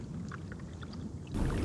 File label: Mississippi Water; Electa Quinney